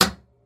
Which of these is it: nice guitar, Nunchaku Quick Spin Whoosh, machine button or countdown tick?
machine button